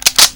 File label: single, cameras